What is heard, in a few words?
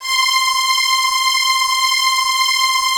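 U220 string; Greytsounds; Scott Peers Sound Engineering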